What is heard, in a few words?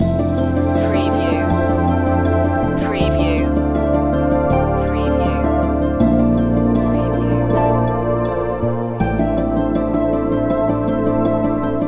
slow angels loop